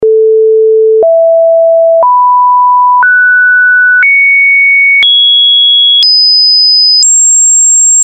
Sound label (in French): suite reelle des 5tes justes